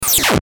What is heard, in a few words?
Glitch Zap Descend Electronic; Production Element Imaging Element Accent Transition